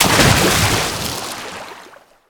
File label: splash, liquid; Minecraft; Conquest Default